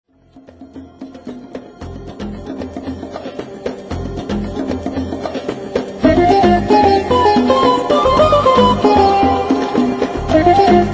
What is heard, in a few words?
Salsa